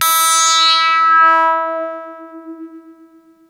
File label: Arp E E4, ARP Odyssey; musicradar-synth-samples